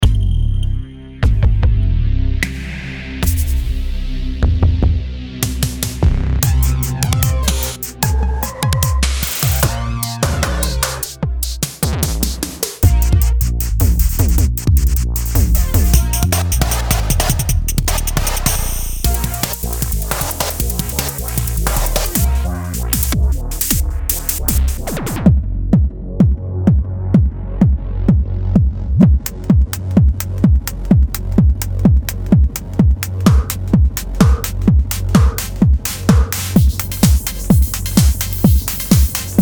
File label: kicks and snares; trailer